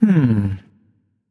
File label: nv thinking; smite; voicepack; ganesha